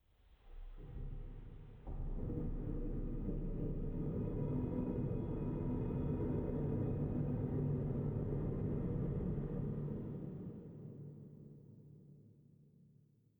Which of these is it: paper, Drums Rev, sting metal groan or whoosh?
sting metal groan